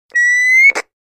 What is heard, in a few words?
Walkie Talkie